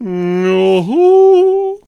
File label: Healer Attack Vocals